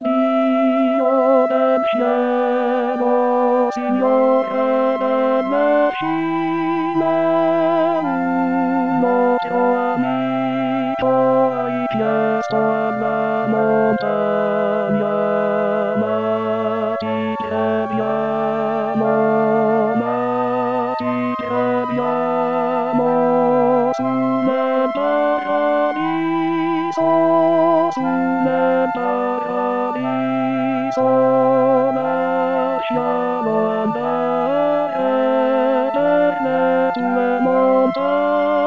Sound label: tenor; signore